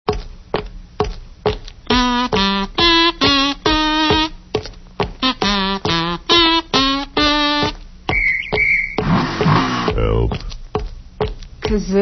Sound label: kazoo